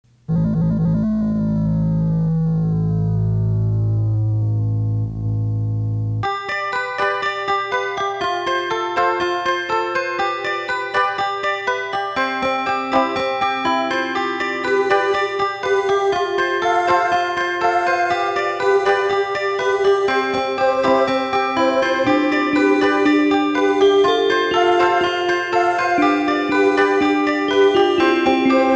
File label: Searching